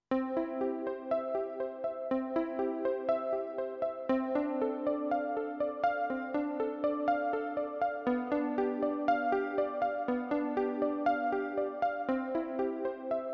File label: Detuned CP80